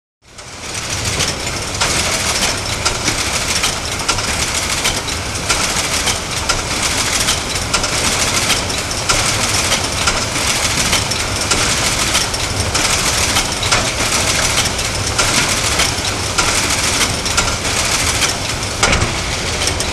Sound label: Machine Light Clanky, factory